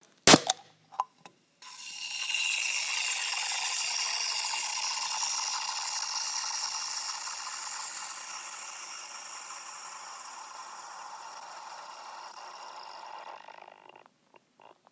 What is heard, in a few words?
can sound